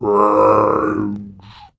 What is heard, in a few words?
Dino Groan